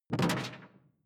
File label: sci-fi alien bubble; swooshes-swishes